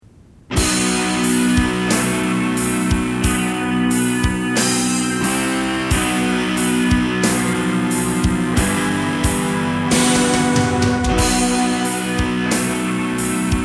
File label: Metal